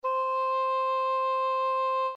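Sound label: english horn; oboe&horh; Real Samples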